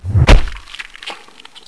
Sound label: panther claw strike; svencoop; sc strangers